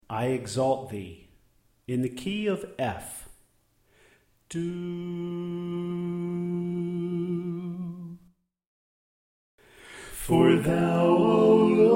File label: Alto Left